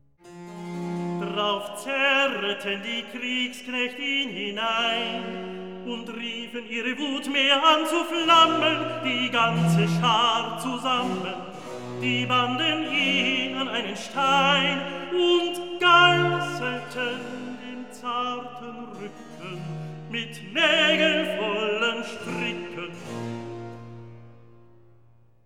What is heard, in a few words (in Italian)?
Recitativo; Drauf zerreten die Kriegsknecht'; Handel; Brockes Passion